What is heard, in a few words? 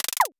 glitch